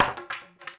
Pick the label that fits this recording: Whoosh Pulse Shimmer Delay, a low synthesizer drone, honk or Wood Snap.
Wood Snap